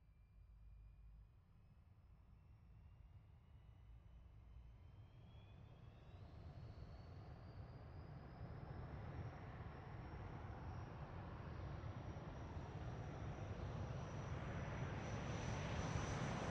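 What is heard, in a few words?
MiG-15 UTI Exterior Takeoff 50m; Bert Foley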